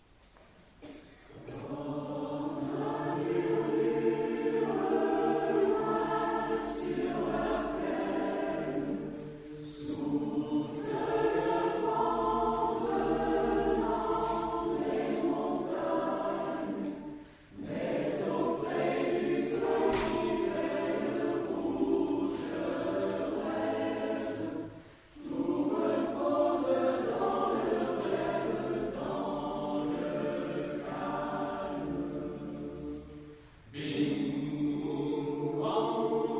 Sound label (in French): CHANT DU SOIR; concert MGEN 27 mars 2011